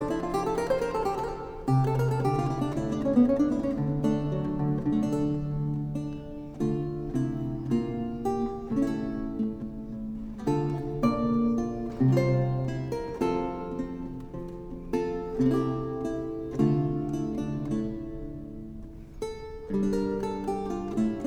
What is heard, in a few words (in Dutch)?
Barrok Luit